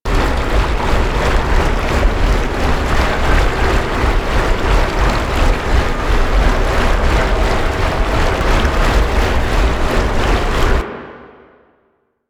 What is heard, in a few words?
Plains Windmill Water Machine Loop; Ambience; Props; Loops; Warframe; Eidolon Plains